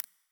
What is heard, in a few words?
typing; steam; deck ui